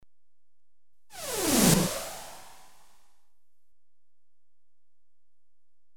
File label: Missile ricochets left to right, Electronically Generated Sounds; Sound Effects Library